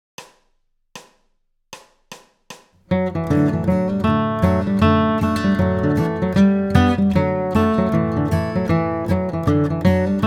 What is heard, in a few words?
Bluegrass Guitar, standard fiddle tune out of C